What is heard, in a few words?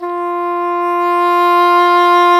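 WND English Horn, Swell; Roland L-CDX-03 Disk 1; Eng Horn Swl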